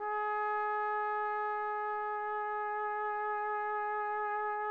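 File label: TPT, trumpet; Roland - LA Composer vol. 3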